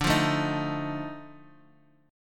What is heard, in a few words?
F 7th D; F7/D